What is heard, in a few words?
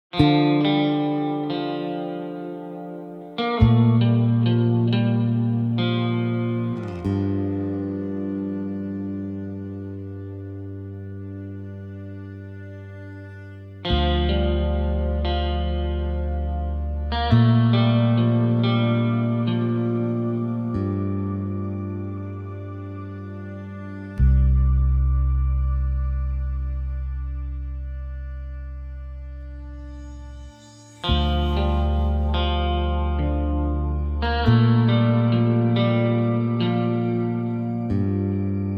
Contemplative Mood without percussion